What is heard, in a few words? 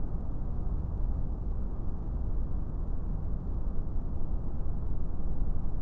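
room small ambience; theed; Star Wars Obi-Wan; XBOX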